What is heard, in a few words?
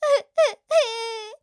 voice Cry